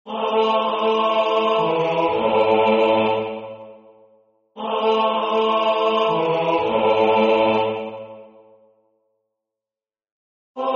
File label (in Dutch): Alleluja Bas; J.S.Bach